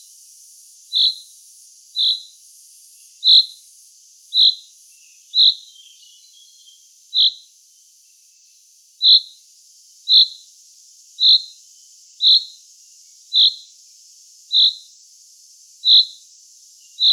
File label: call, chaffinch; a dialect typical of this zone; Fringilla coelebs